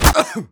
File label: pain, player; Team Fortress 2